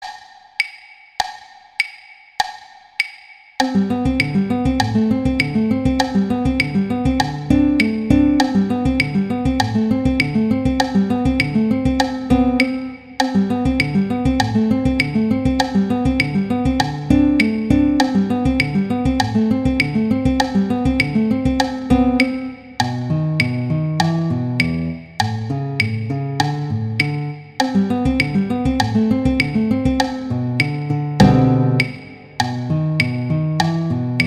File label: Allegretto Violin; Paganini; Sonatas Op1 Vl Gu; Sonata No 2